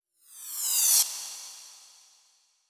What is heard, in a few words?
Cymbals Reverse Crash; Vengeance Samples